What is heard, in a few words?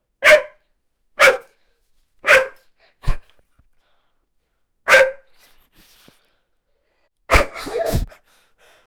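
sudden aggressive dog bark; clothing rustle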